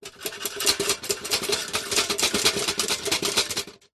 metal loose pots rattle shake fast

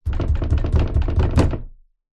door front wood knocks fist light slight rattle; household

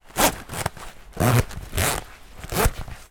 foley life jacket zipper

